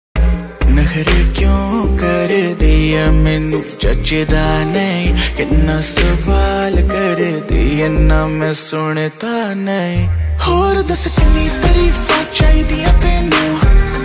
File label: rap ringtone